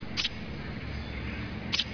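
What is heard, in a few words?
downy woodpecker, birds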